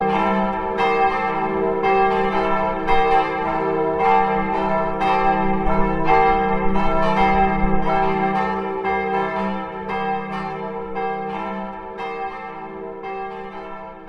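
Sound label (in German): glockengeläut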